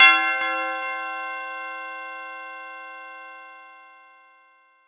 Percs

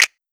Snap

